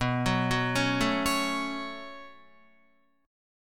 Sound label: B 7th Suspended 2nd, Guitar; 6,9,6,6,9,9; arpeggiated; JGuitar